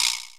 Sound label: VIBRASLP; Crypton - DrumBase5000